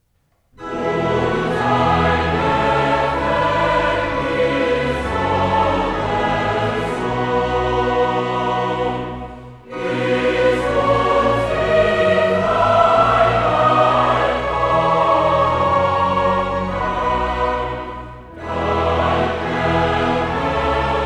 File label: Chorale; Durch dein Gefängnis, Gottes Sohn; Bach Johannes-Passion BWV 245; Karl Richter (conductor)